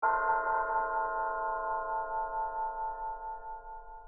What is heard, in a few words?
bells test